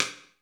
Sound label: ROOM P STIK, ROOM KITS; Crypton - DrumBase5000